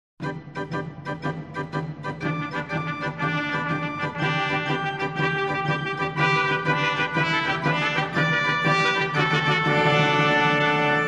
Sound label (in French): Orgue, trompettes